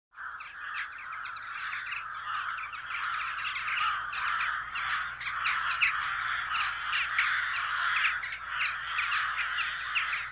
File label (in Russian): shumnaja staja voron na fone galok